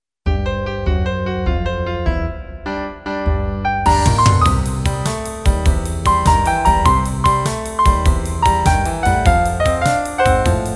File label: Blues